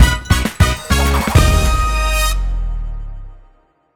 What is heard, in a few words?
bigwinend; netent